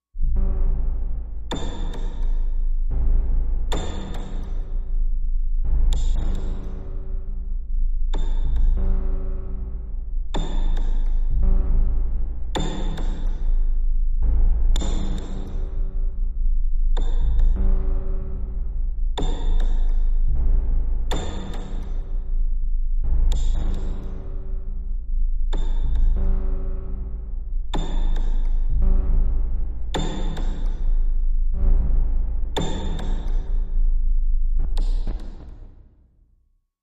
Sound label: Horn Bender Machine, industrial